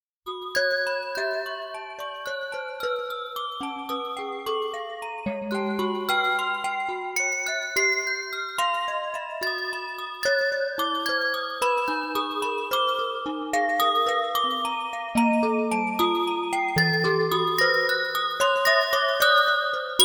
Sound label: Bells